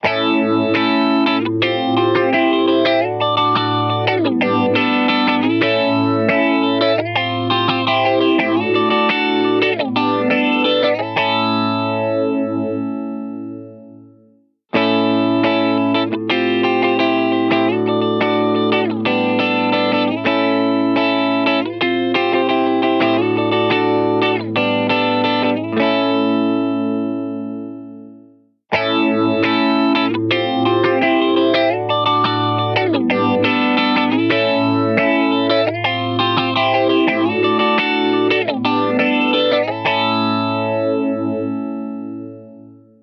Guitar Phaser; Instant Phaser; H90

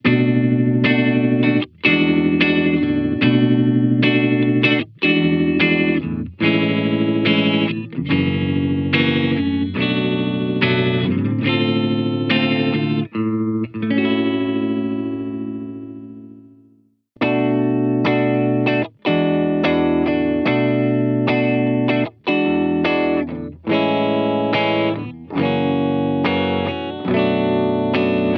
Guitar Vibe; Even Vibe Air Bubbles; H90

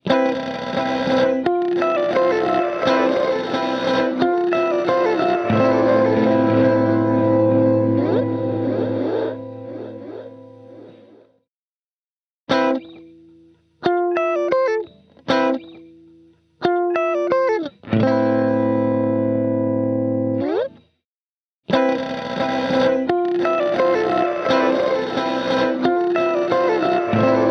Guitar; Tidal Waves; H90

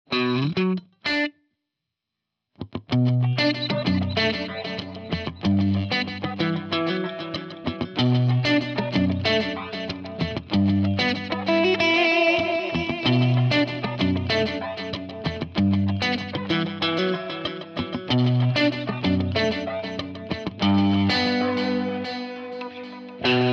Tapped Line; Strymon TimeLine MX

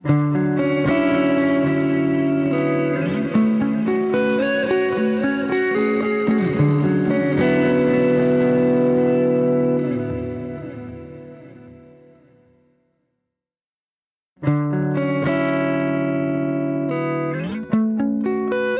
H90 Programs Guitar Clean Ambient

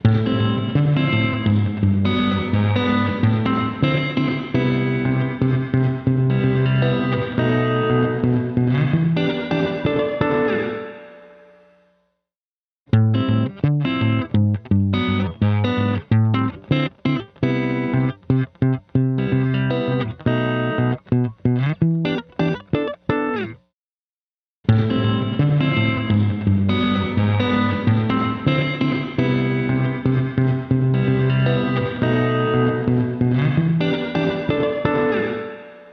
Guitar Recital Hall; H90

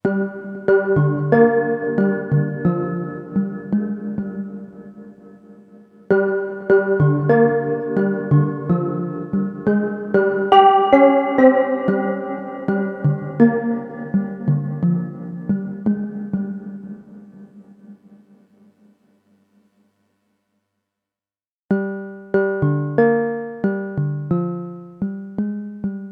Synth; AuraProjector; H90

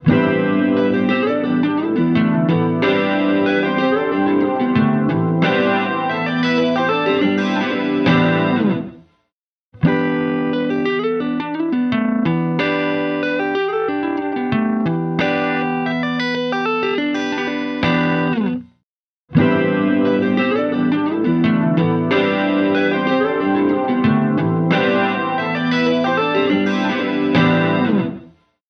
Guitar; Awaken; H90

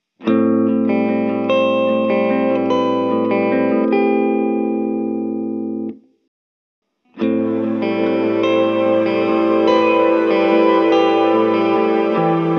Trailing Pads

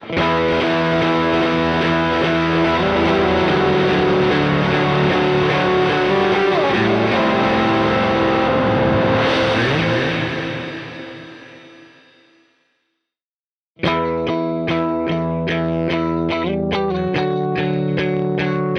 Guitar Shoegazer; H90

